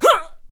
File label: Clubber Death Vocals